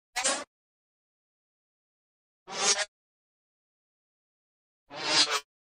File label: Synth Bug Fly Bys Thre, insects